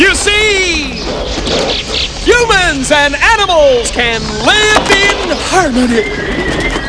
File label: harmony